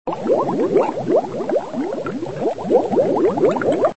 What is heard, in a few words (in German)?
Blub